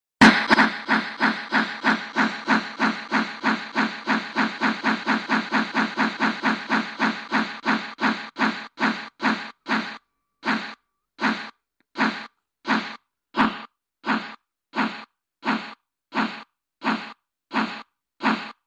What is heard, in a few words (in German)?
DAMPF; basic soundmodul fuer analog digital